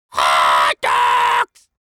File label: creature dwarf scream hot dogs